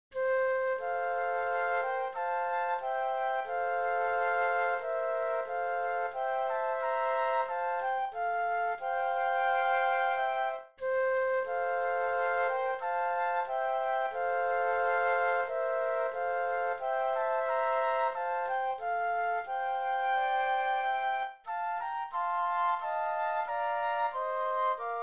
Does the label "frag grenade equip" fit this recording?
No